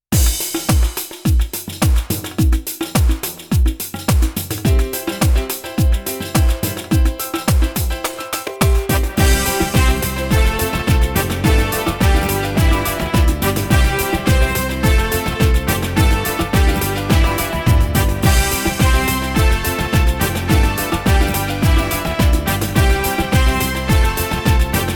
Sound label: samba, ballroom